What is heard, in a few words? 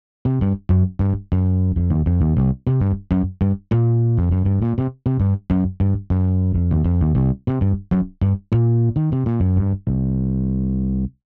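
bass warm compressed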